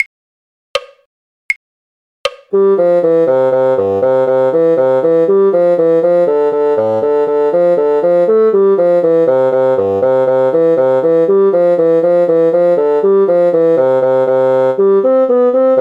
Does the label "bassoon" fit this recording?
Yes